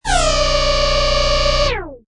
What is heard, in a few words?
Protons Machine Short, Strange scifi machine or weird apparatus starting up